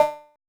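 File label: CHZ cowbell LO; Drums In Your FACE!; CHEEZEKIT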